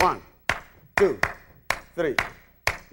subdivision of 3 starting on first partial loop

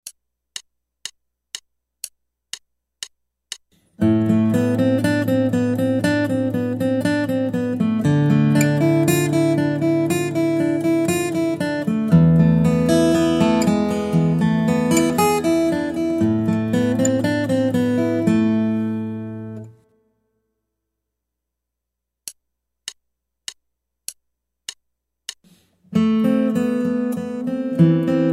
Guitar

